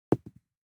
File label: DICE on Felt, Throw and Roll, Standard, 1 One Die; Samples; ArticulatedSounds; DICE Sfx Party (Extended)